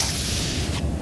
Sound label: door